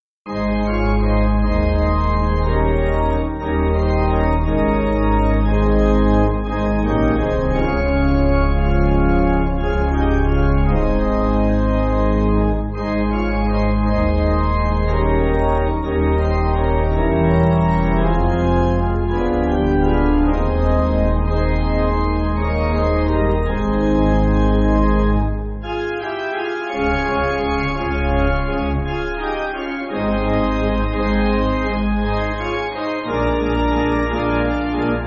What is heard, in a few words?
Pipe, church music